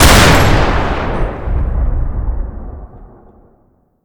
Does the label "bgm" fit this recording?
No